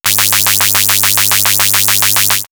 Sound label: Pylons, synth fx; StarTrek; X-Static Goldmine